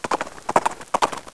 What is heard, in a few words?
horse